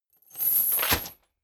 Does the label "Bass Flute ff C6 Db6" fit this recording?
No